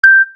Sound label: success